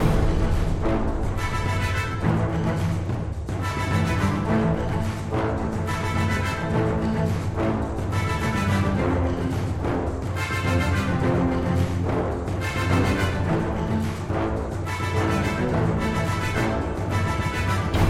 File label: bonus music loop; Recode; rampage riches